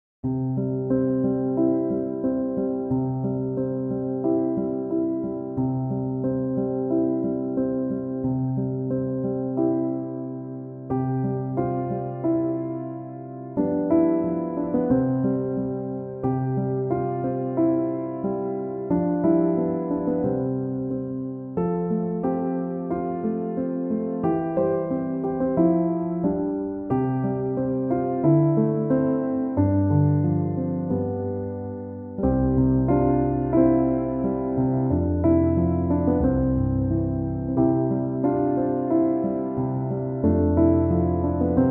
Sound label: chill piano instrumental; from “Tangled”